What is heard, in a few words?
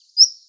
song; cofl; nm